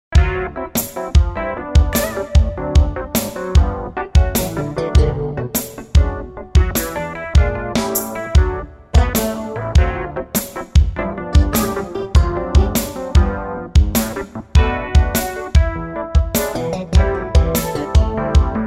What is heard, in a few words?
tapping dx7